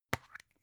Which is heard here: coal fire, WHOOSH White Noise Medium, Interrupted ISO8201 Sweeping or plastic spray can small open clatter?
plastic spray can small open clatter